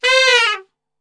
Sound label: C 3 SAX SHF, Sax; Giga Samples Collection